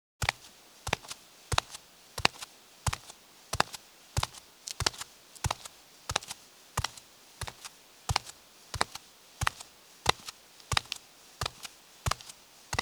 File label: Footsteps, Foley; Sound Ideas 6016